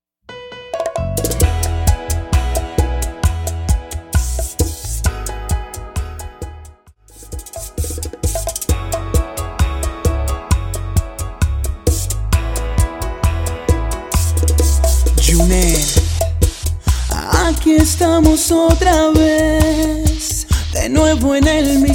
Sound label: Intro Outro Percapella 132 bpm; Latin genres